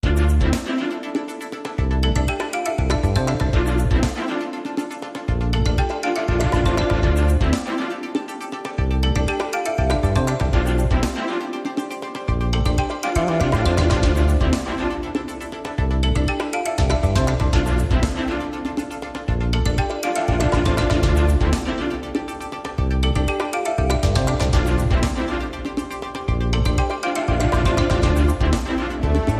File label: Commercial Break Segue